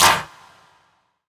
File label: clap, hip; Best Service; Dance Mega Drums